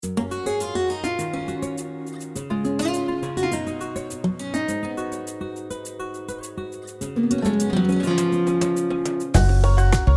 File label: pop latin